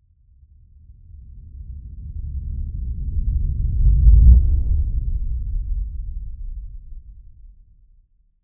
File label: Impact; Hits